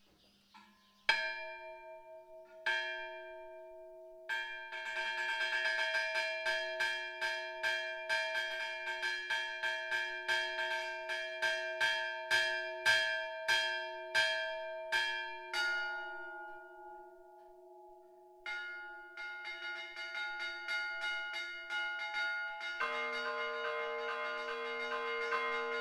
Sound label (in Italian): SUONATA DI GLORIA per le processioni, campane; Le campane del campanile di Santa Maria del Piano